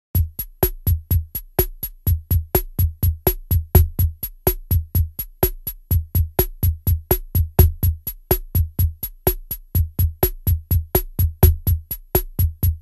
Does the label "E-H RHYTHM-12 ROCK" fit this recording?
Yes